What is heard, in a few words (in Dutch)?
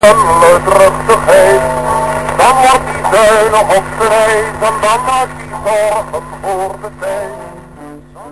radio